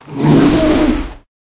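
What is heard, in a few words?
Ice Bear buff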